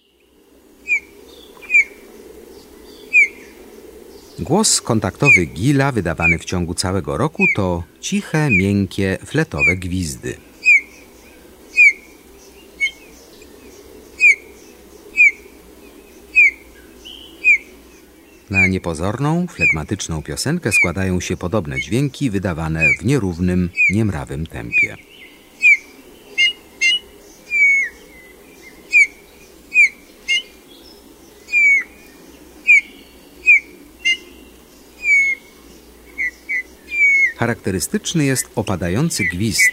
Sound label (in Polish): Gil, Ptaki; Kurs PTTK